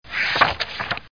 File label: pageturn; CYPHER